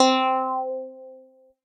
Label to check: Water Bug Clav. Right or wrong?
Right